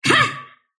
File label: sylph attack short; dota; dark willow